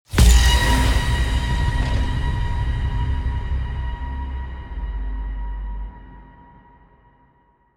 production elements impactor

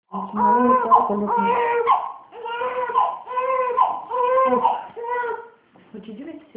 hungry, Donate a cry

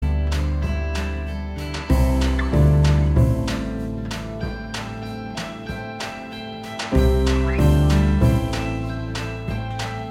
song

